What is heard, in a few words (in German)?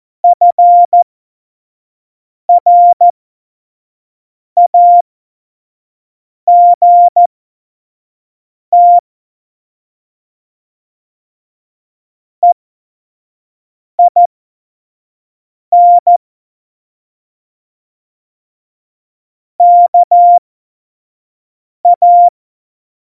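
Witz, cw morsen; Morsekurs; Witze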